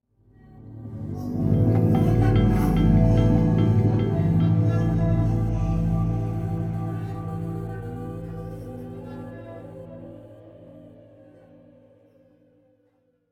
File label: Demons Stinger